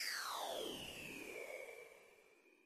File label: warp